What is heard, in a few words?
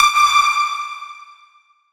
synth shot D; Electro; Anthemic; synth shots